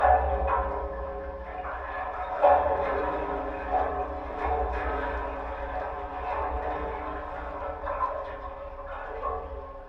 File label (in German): schweizer seelied, Water; Percussion; Romanshorn/Schweiz; Christoph Collenberg